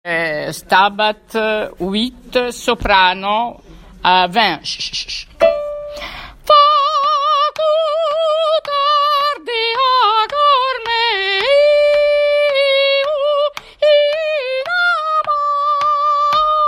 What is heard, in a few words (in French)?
soprano rapide; Mesures 20 à 33; Stabat Mater 8 Rapide